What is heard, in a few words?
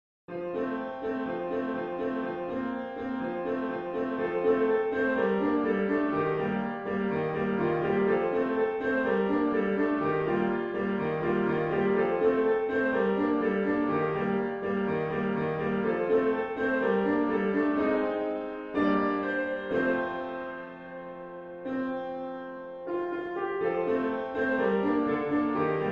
Piano Cover